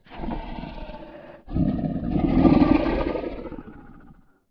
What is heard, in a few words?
Gold Dragon long breath a bit aggresive in the middle; SyrinScape; Vault of Dragons